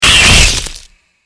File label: screech attack